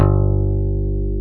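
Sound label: G1, Bass; Best Service Gigapack 2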